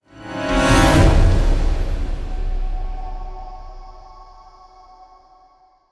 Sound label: Combat Med Threat Trans Stinger; gamerip; fortnite